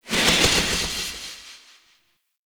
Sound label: Impale Cast Release Tail; Warframe; Hoplite